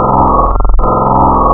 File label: clipp, wavetables, Classic Synths